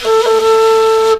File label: FLUTE LIN; Best Service; Ethnic Journey Sound Cube